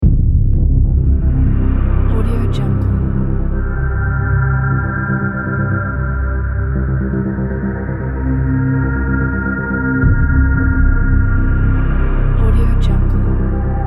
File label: scifi abandoned cinematic atmosphere intro